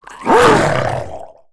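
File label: rakghoul battle; Star Wars Knights Of The Old Republic I; XBOX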